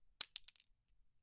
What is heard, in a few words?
metal pen falls; office-pack